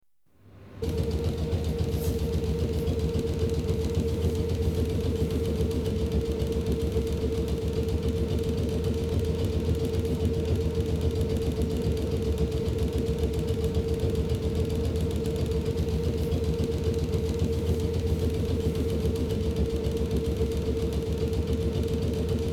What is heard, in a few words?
Electric Trains Compressor run; The BBC Sound Effects Library